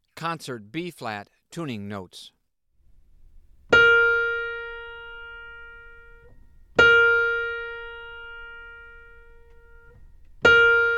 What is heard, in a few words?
Tuning Notes; James „Jamey“ Aebersold Play-Alongs